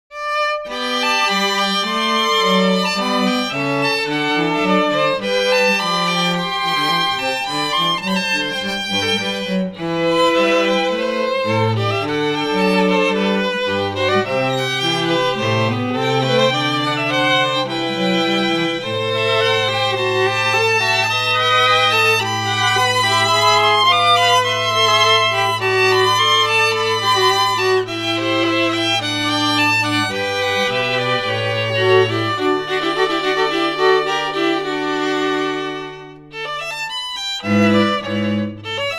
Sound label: Morning Music (Bubble System); NEShooting; retro game music; classical